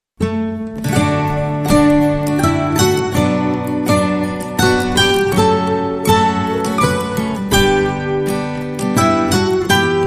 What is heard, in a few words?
Acoustic Guitar